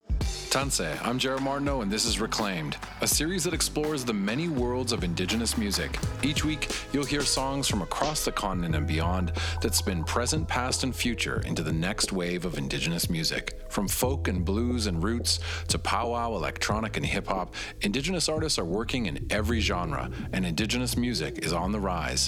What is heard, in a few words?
Promo Rap Music